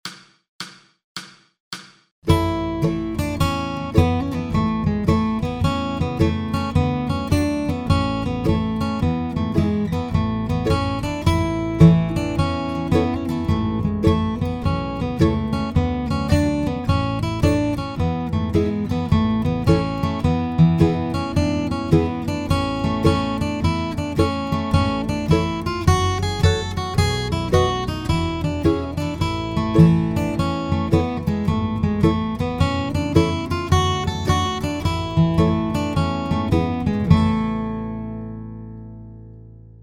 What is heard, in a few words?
deck the halls guitar grass slow